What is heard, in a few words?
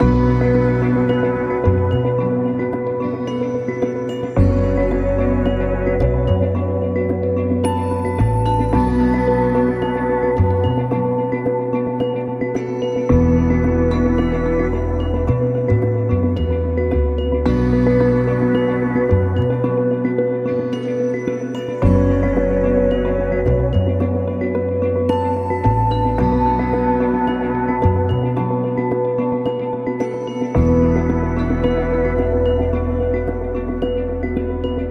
Loop No Drums